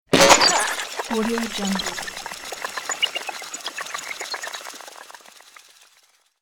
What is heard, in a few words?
robot head hit